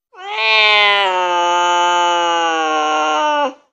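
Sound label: Cat Domestic Meow Angry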